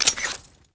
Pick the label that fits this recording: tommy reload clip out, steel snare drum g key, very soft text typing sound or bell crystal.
tommy reload clip out